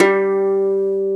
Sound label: UKULELE G3; AKAI S-Series CD-ROM Sound Library VOL-7